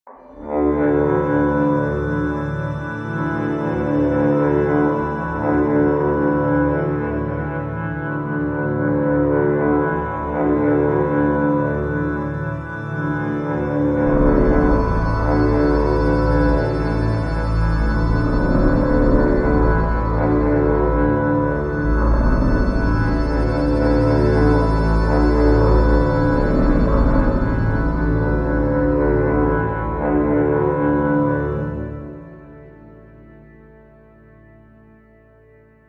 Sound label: Honker, kontakt